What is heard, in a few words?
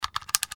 switch